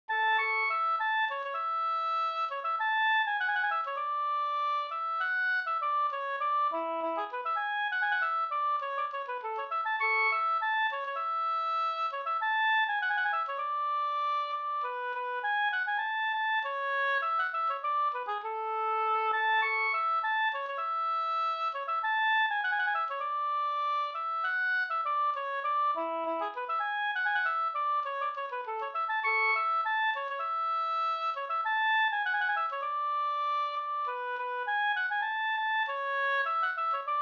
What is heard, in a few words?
polka polkett; fr Bingsjo Da